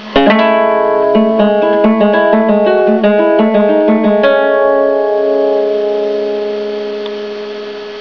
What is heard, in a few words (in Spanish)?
arpegio